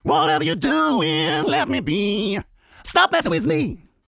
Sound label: Singing Voice; tsmtoolbox